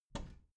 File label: bathroom cabinet door open heavy